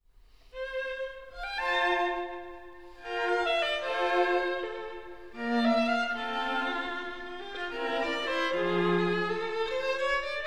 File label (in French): Trio En Si b Majeur